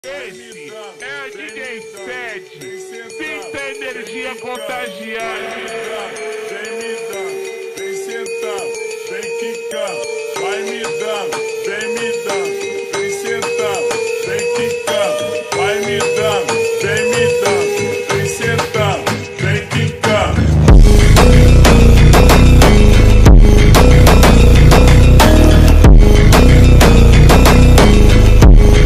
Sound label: funk slowed reverb brazilian phonk